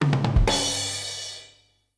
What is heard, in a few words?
taunt drum solo; BTDB2